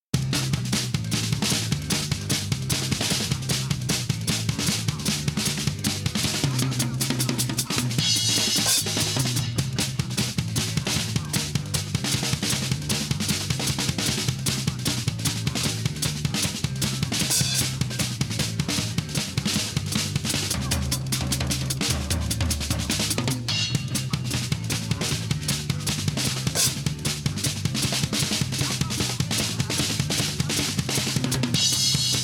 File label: drums